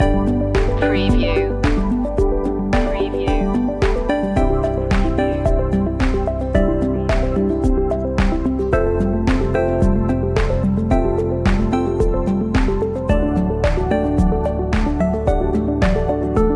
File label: corporate dreams loop